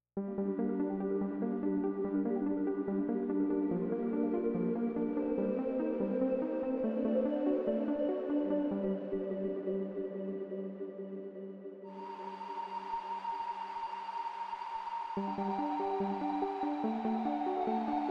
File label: Pad; XantaFe; música; juego